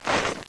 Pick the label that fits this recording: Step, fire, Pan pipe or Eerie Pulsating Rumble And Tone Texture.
Step